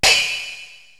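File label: HH Clangy Open, hi hat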